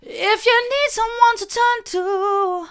if you need someone to turn to soft, vocal

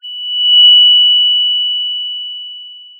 pop, sound of a tea kettle whistling

